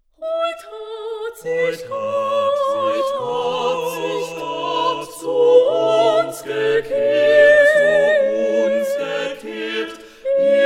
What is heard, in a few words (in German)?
Heut hat sich Gott deutsch